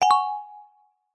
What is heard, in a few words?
multimedia notification bright metal tuned mallet generic; zapsplat